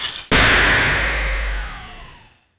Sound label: spark impact damaged droi, robots